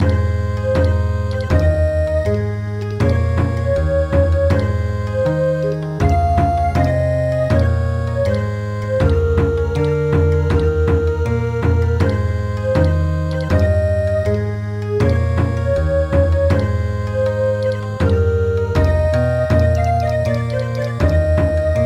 Bon Odori, midi

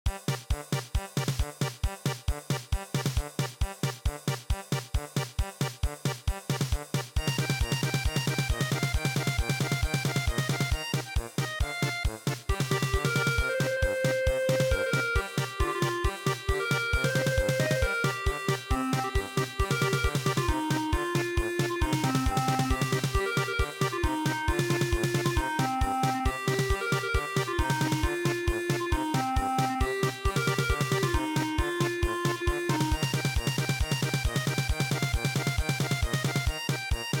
Gidda German Polka

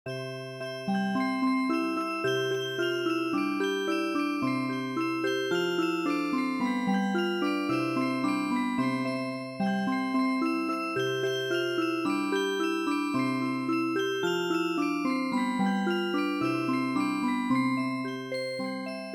ciranda cirandinha Music Box

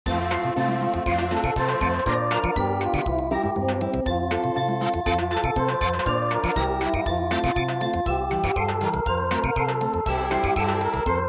Samba; midi; traditional

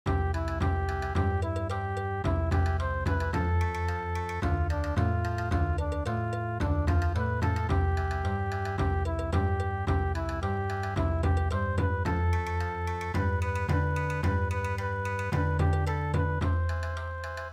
Bon Odori, midi

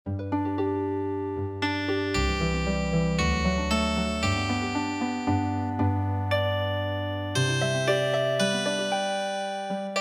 Healing Harp

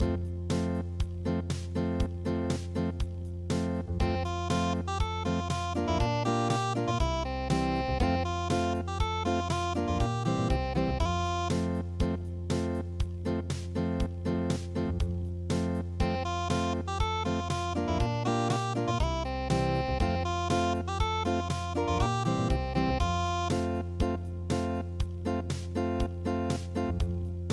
The Muffin Man unpluged

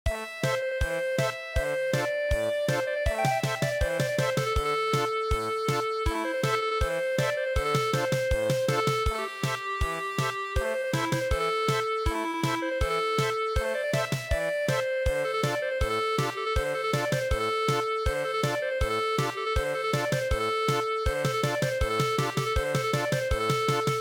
German Polka

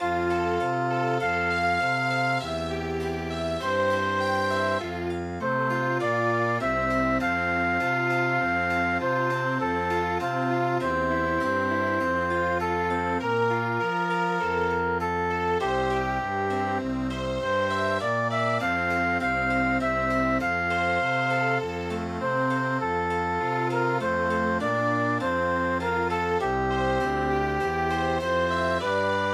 Chamber Music

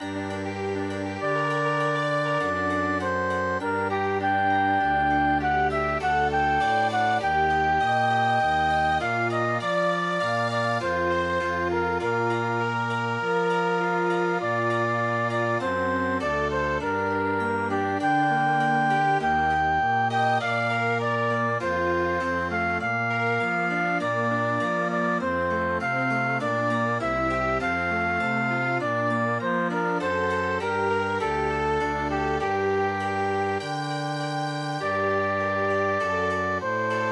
Chamber Music

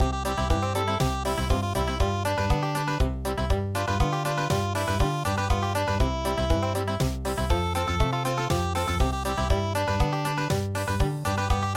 Fiddling country; midi; traditional

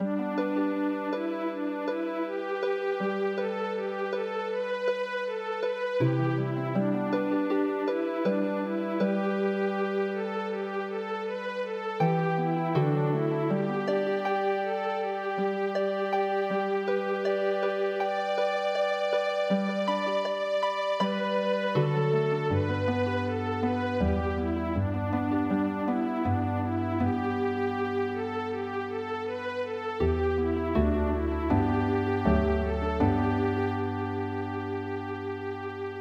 Arirang Healing Harp

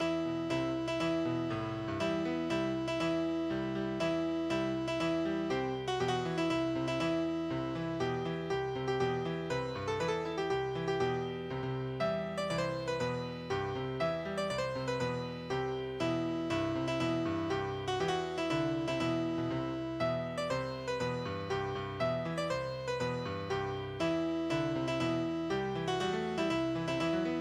Trauermarsch Classic Piano

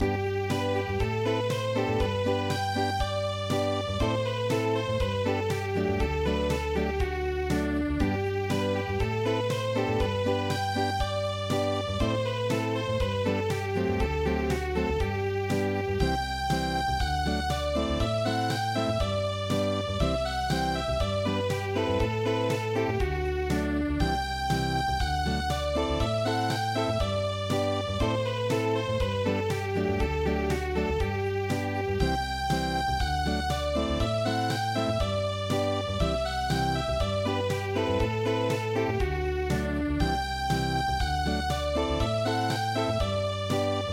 Unplugged

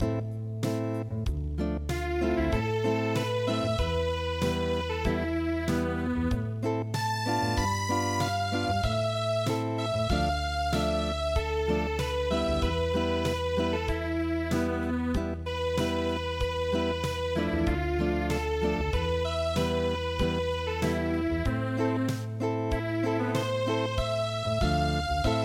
Acoustic

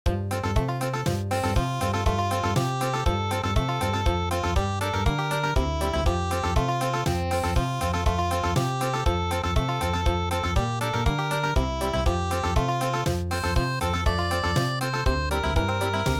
Country

